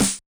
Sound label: Yamaha DJX 2B, SD T9 Dist